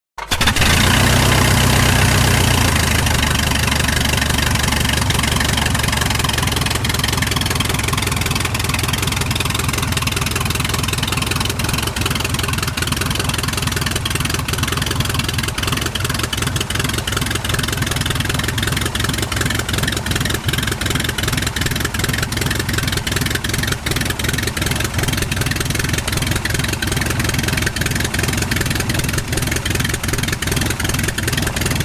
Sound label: Prop Plane; Start / Idle; Tiger Moth Prop Aircraft Start Up And Motor Idling.